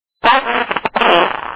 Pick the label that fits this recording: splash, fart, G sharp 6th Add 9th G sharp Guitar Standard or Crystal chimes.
fart